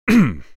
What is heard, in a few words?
Male throat clearing